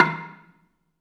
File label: bela2 D#2, belafon; USB Soundscan vol.60; AKAI